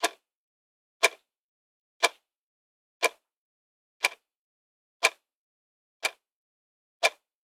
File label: Ticking Clock